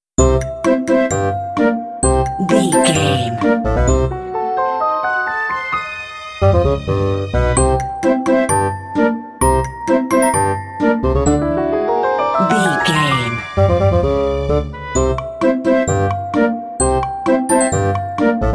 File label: cute little story